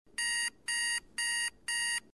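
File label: zvuk budilnika, alarm clock sound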